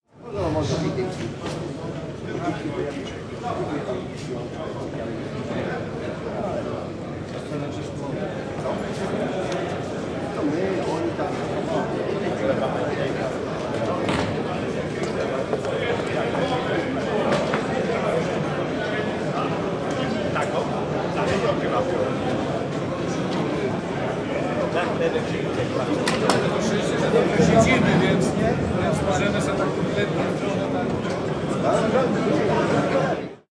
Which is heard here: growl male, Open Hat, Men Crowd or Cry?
Men Crowd